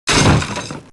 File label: Ballista Death Sound; Heroes3; War Machine